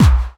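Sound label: snare drum bass drum, Dance Mega Drums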